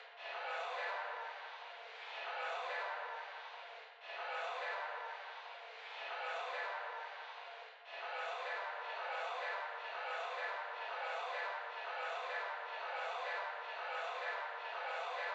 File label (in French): voc fonds